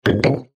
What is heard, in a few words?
Chime Multimedia; Web Interactive; Chimes (Sound Effects:Multimedia)